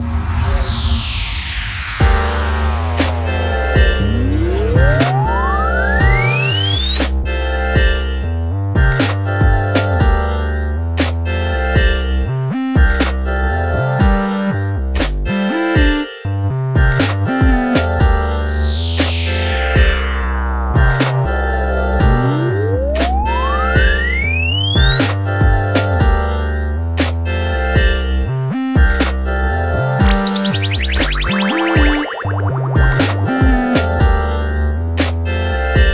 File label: level failed